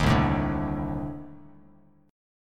C Minor 6th Add 9th C Bass Standard; Cm6add9 strummed; JGuitar

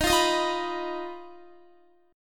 D sharp Suspended 2nd Sharp 5th, Guitar; D#sus2#5; strummed; x,18,17,20,17,19

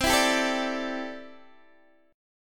C 6th Add 9th C down Guitar Open D

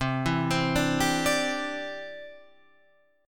C-Major Add 9th

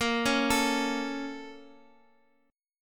Bb Minor Major 7th, Guitar, Drop D; arpeggiated; chord shape; x,x,x,3,2,5

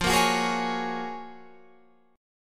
F sharp Major 9th

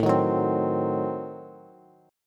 A Diminished 7th A down Bass; Adim7 strummed; JGuitar

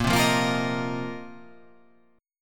A sharp Suspended 2nd Suspended 4th down

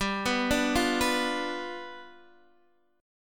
G 7th G down Guitar Open D

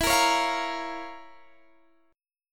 F Major 7th Suspended 4th Sharp 5th E

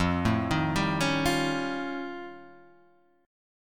F Augmented 9th F, chord

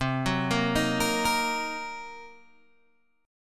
C Minor 7th Flat 5th Guitar Open G, chord

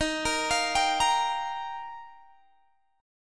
Eb Major Add 9th, Guitar Open G

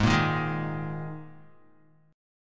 G sharp Suspended 2nd Guitar chord, down; strummed; 4F,4A,5C,5G,5C,6E

